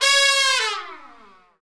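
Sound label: brass trumpet; Roland L-CDX-03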